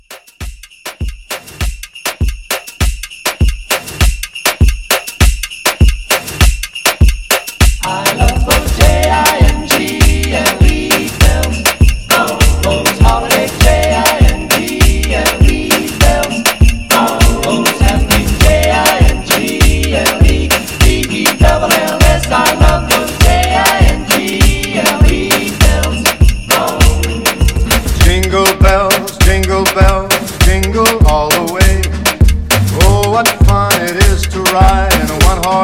Christmas Moomba Re Drum 100

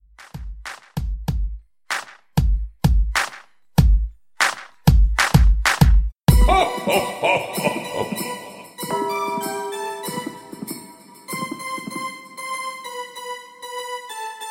Christmas Hip Hop Redrum Intro; Remixfreakz

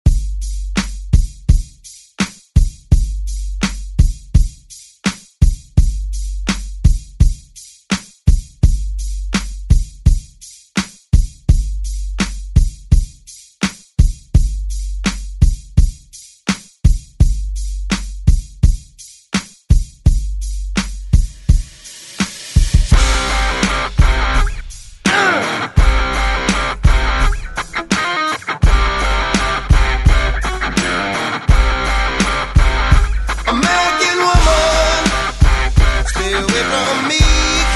90s ReDrum; DJ Mhark

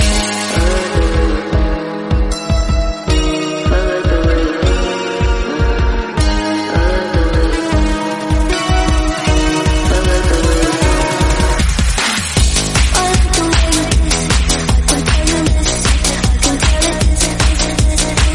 155bpm B

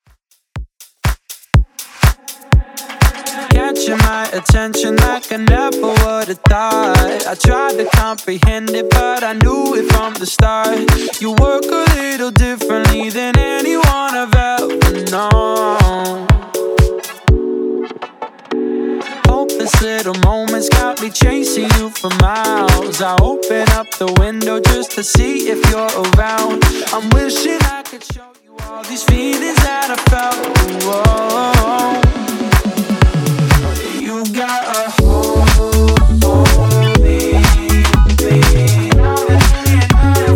Top40 Redrum 122bpm

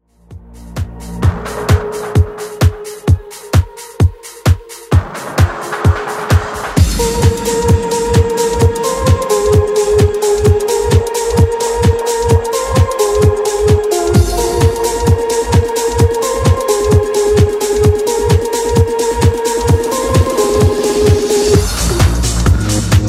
90s Redrum 130 bpm CLEAN

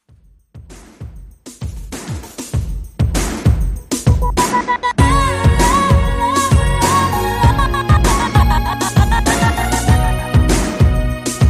90s Redrum 98 Bpm; remixplanet

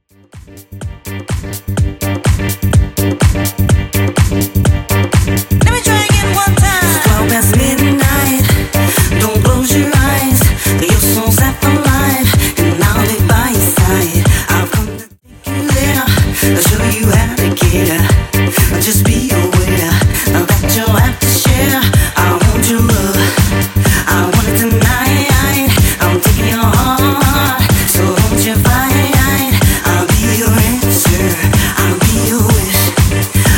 Techno House 125 bpm

